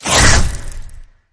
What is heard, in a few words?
Carve Skid Whoosh